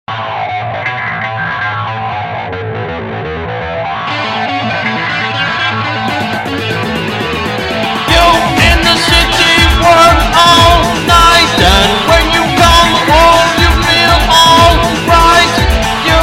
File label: techno metal